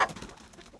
chicken; mobs animal

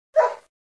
puppy bark; petz

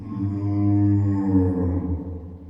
creatures oerrki idle

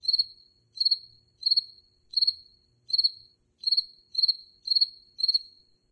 cricket; dreambuilder game

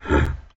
zombie charge; zombiestrd

